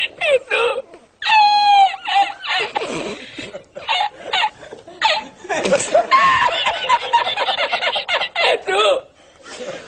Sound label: spanish guy laughing full